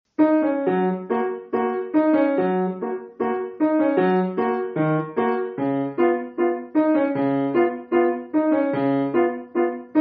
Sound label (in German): Flohwalzer